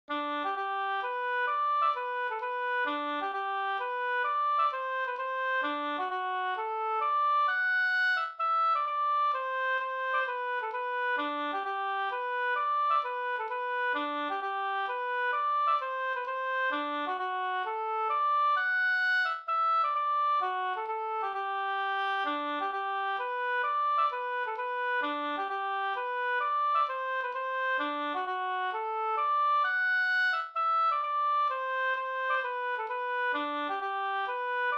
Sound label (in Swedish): hambopolska